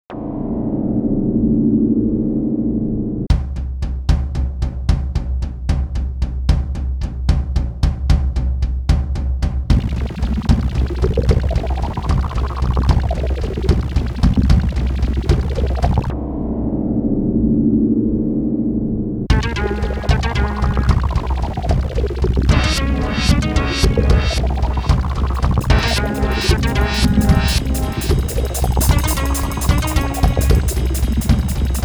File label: loop